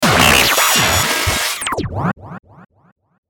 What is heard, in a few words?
Stinger Distortion Buzz Delay; Production Element Imaging Element Accent Transition